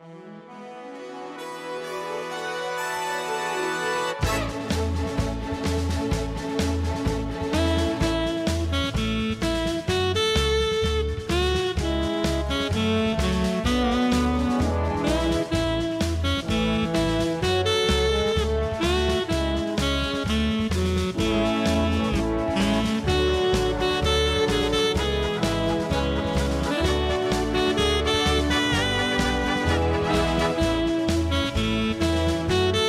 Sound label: Instrumental